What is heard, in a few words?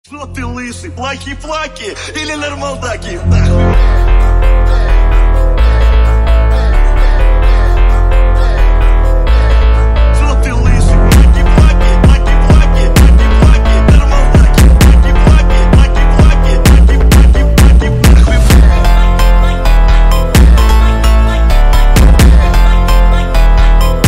Phonk remix